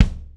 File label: kick, dry kit